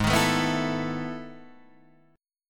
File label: E Major 11th chord